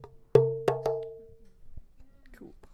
para udarov po krayu krasivogo ruchnogo barabana s glubokim bogatim i organichnim tonom

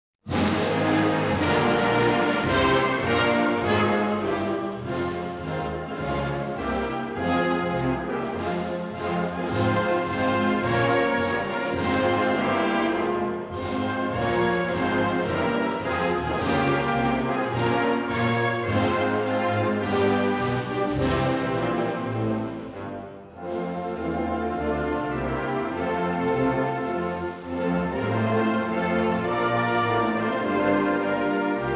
gimn trinidada i tobago

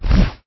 perevernuli bolshoj kamen

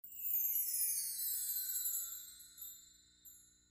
zvuk volshebstva dlinnij

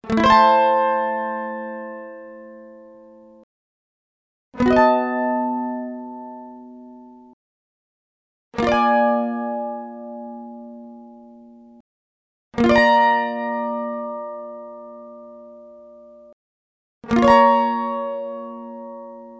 zvuki mandolina mandolin chord

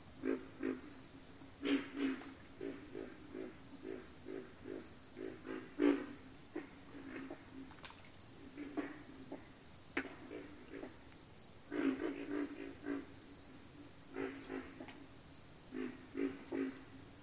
vechernee ne gromkoe spokojnoe sverchanie sverchkov i kryakanie utok

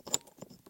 skrezhet ruchnoj otmichki

